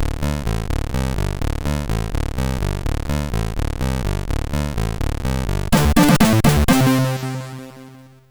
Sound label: Jingle, sid MOS6581R3